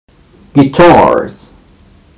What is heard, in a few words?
guitars